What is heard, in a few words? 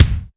BD, Bassdrums, Roland TD-20